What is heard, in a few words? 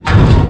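Collision Interior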